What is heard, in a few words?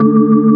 HAMMOND D2, organ; AKAI S-Series CD-ROM Sound Library VOL-2